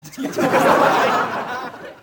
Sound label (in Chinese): 大众笑声-笑声